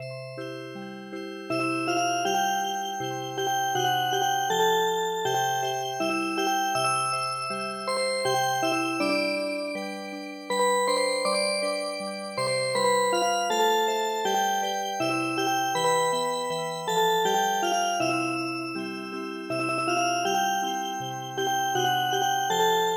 Music Box

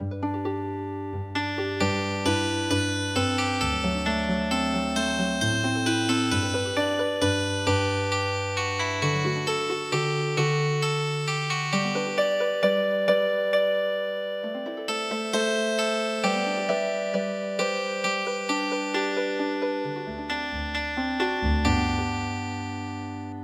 relaxation evening Healing Harp

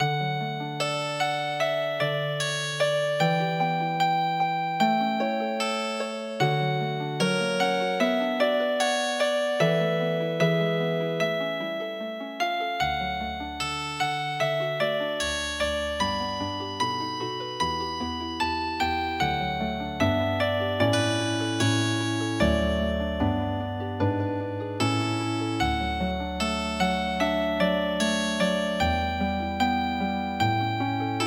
Healing Harp, traditional; Salut d amour

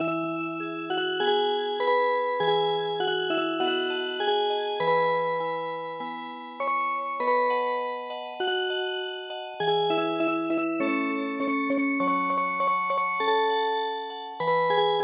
choo choo train Music Box

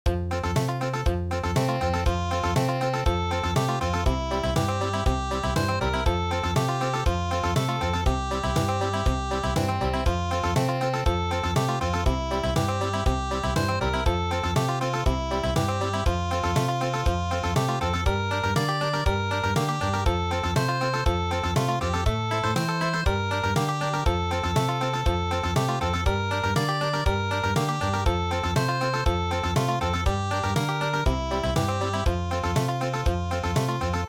Country, midi; Happy Time Has Come

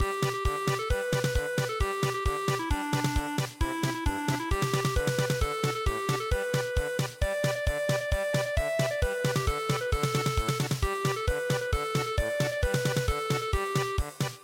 railway song polka

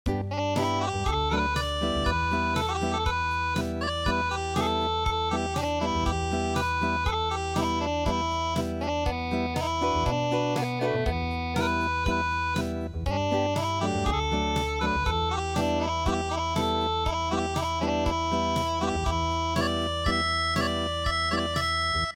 Unplugged; hanagasa ondo

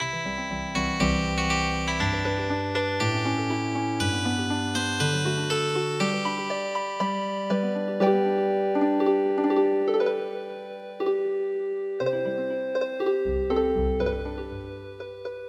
Healing Harp

